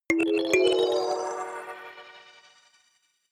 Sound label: notification kalimba magical second up